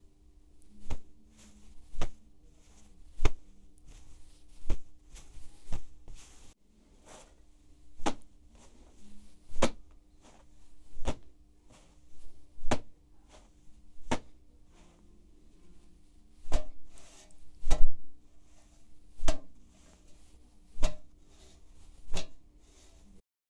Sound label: Dropping A Damp Cloth On Different Surfaces